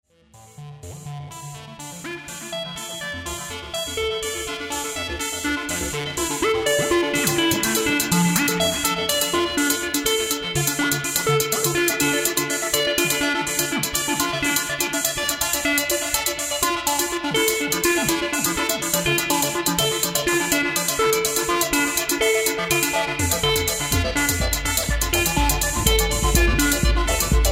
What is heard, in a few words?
variation plus 808